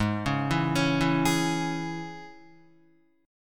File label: G sharp Suspended 4th Sharp 5th G sharp, Guitar; G#sus4#5; arpeggiated; JGuitar